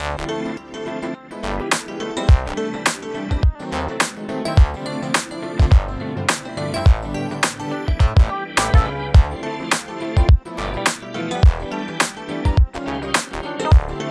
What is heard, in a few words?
menu main